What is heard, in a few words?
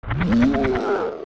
Armos Alive